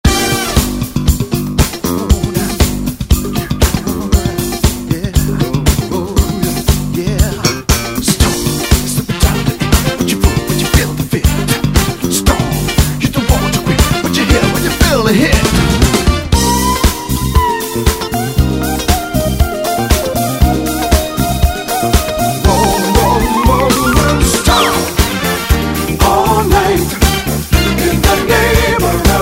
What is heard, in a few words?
Stomp; minimoog